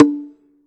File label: Bongo